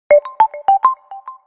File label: navi error; Nokia Lumia-710